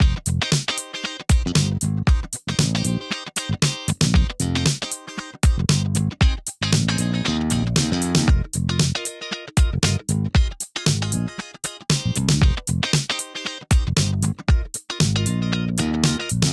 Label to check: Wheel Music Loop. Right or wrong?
Right